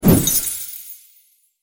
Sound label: jump; freak fortress 2; library of ruina; roland